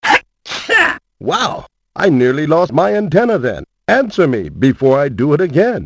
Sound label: Atchoo